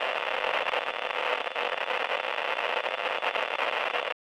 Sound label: Distort